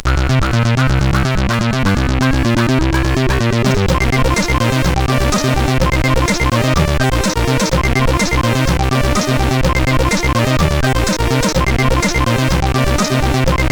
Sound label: funk level, CSG8580; CSG8580R5; Orcus; hvsc